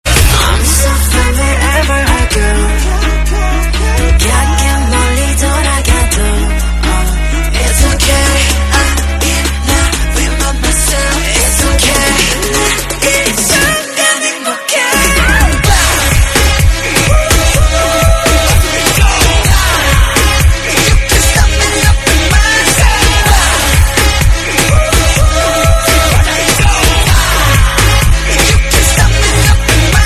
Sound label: korean ringtone